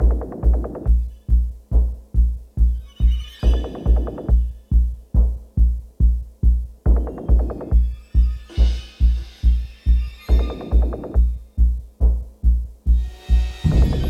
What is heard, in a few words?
Action, Loop, 140BPM, Classic Horror Sci-Fi Music; Dead Ark